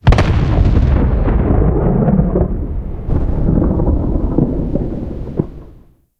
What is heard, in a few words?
cannon 100 122 tail far; tanks; War Thunder